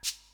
desktop switch left; sound-theme-pika

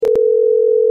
phone outgoing calling; sound-theme-pika

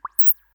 audio volume change; sound-theme-pika

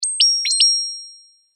battery low; sound-theme-pika

